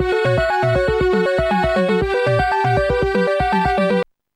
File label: jazz six; Instant Music